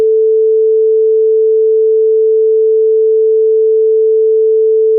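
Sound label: Dithered Sine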